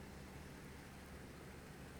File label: pulses, BALAENOPTERA; MONACO 2022-07